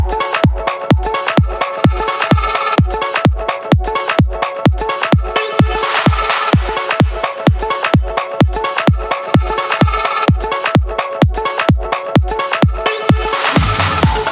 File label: Fun Dance Beat